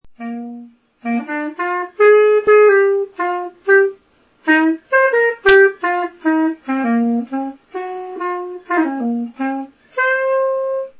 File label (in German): xa vergleich bb maui, Xaphoon; Blasinstrumente